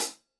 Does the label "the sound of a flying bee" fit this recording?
No